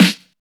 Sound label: tight low end snare drum sample g key